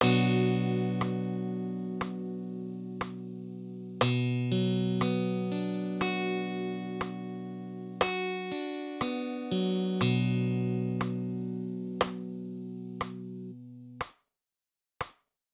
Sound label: Bm rhythm